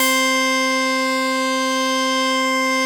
basses; Wizoo - Powered Wave

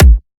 drum kick best; Virtual Riot; Drum Hits

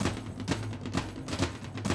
130, Classic DrumLoops

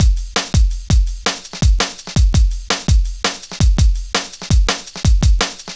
Drum Loops, Jungle, 166.5; Zero-G Jungle Warfare 1, 2 & 3; Breeze; 90 s Sample CDs

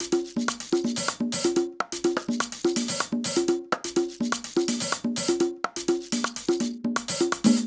top perc, 125 Beats

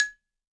balafon g#4; World Instruments 2; WORLD PERCUSSION; PS BALAFON

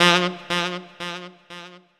Sax Echo

